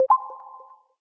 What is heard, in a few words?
click short confirm; JesusOmega {NM} 『Planets』